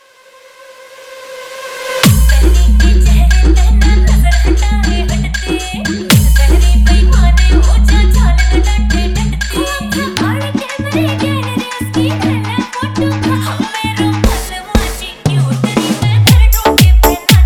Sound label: Dj Remix Hard Bass Haryanvi Song